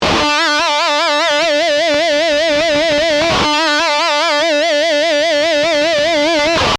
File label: Vibrato Whole Step, guitar